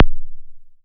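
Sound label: kick, ARP 2600 Drums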